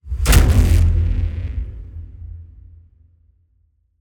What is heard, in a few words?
Impacts designed; Just Impacts; soundbits